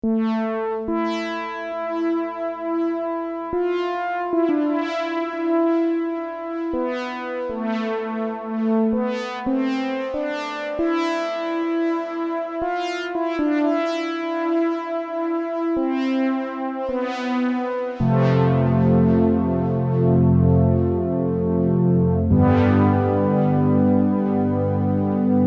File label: brass; antilles